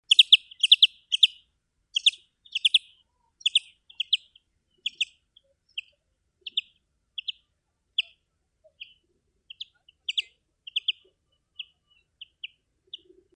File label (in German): Grünfink Flugruf